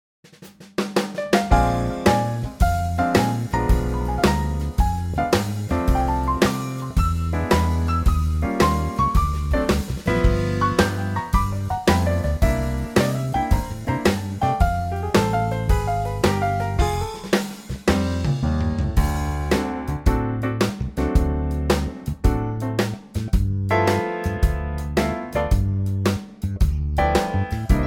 F Trio

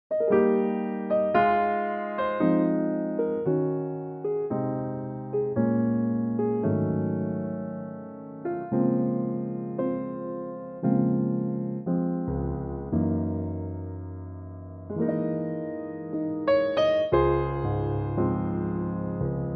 Db Piano; key - Db - vocal range - C to Eb

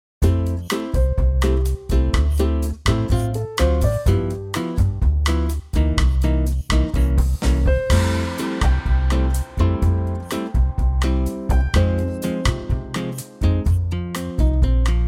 F bossa; key - F - range - Ab to A

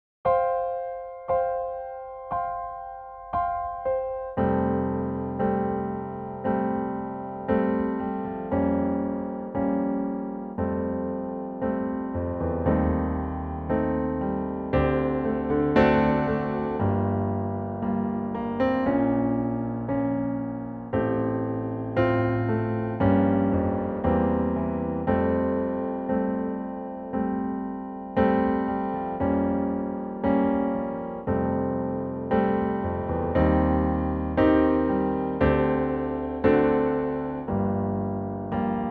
piano trak F; key - F; vocal range - E to A

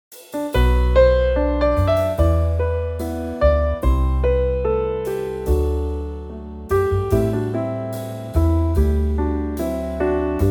G Trio

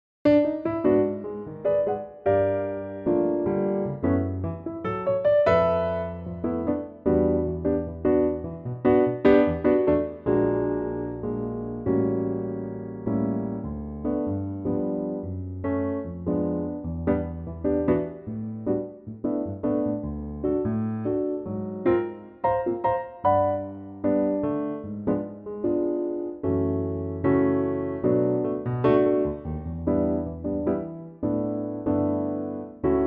piano; D version 2 Pianotrak; key - D - vocal range - G to E (huge range!); Another fresh 2016 Pianotrak arrangement, this time with a latin feel!

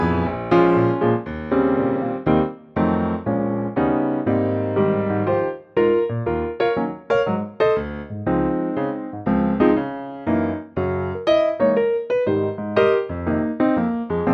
Ab piano; 2nd time through the feel goes to half tempo; key - Ab - vocal range - Ab to C#; Superb piano arrangement of the classic standard - bright in tempo then to a half time feel 2nd time thr

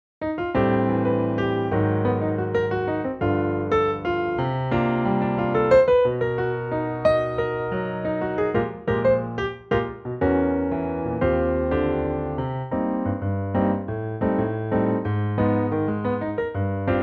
Eb latin Piano; key - Eb - vocal range - B to Eb; 2024 Latin piano arrangement; Pianotrak

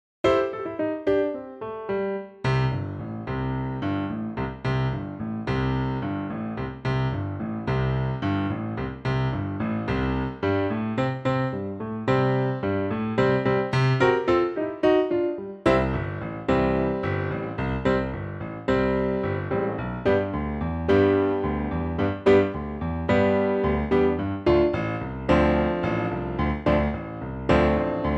piano C; Pianotrak

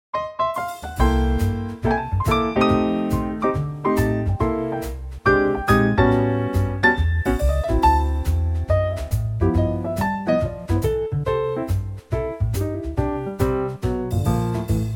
trio C; key - C - vocal range - G to A ( optional C last note); 2018 Trio arrangement of this great jazz classic

